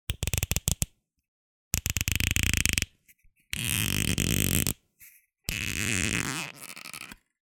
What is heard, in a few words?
foley bottle cork twist creak; zapsplat